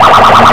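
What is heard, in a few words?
australia siren warning; gmod; lwsirens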